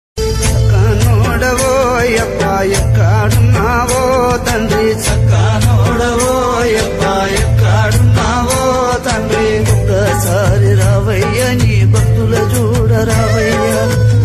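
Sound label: Telugu background music ringtone; ayyappa swamy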